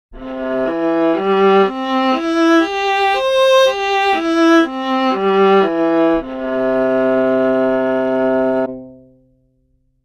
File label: viola; automated real time articulation selection